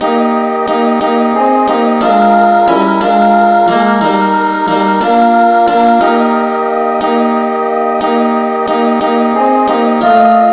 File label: angel voices rev no vocal key ees